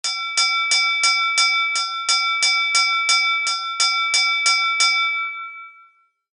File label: Anchor bell; colregs